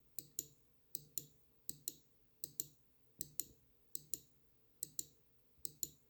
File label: Genesis Zircon XIII Kailh; Genesis Zircon XIII review